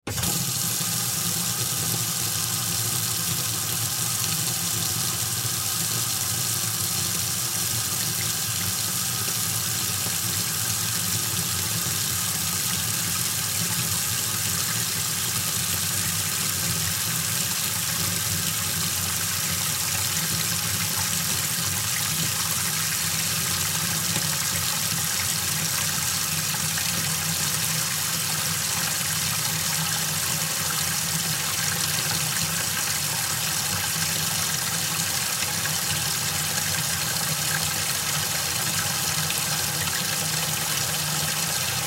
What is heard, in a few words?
Utility Sink Turn On Fill Up On High Long; Laundry; Household; Blastwave